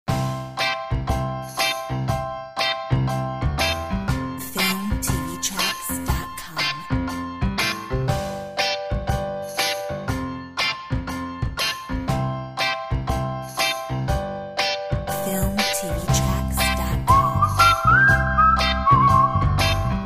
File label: whistle; whistling and happy music track